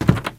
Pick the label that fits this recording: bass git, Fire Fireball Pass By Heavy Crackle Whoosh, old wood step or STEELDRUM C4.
old wood step